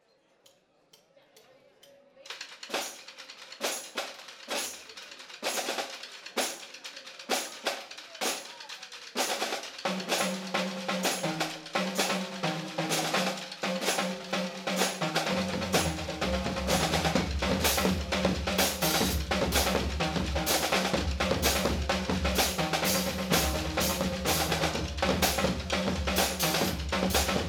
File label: Groove; Green beats